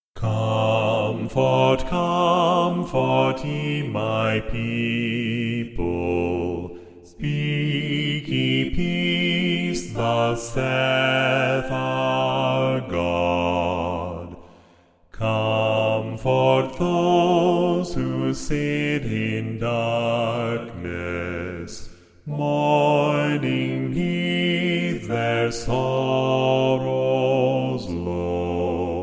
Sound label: comfort bass